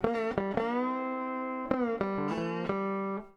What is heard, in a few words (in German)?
bottle, gitarren